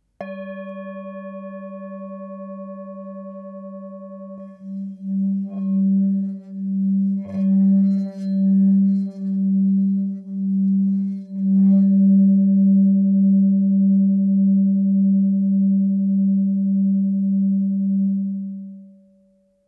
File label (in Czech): tibetska misa; nagpa stredni